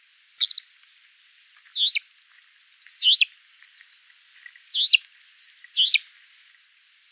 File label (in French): alouette calandre